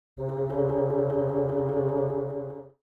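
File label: Cartoon bassoon trill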